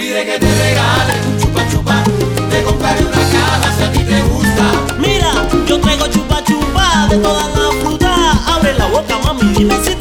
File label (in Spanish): marcha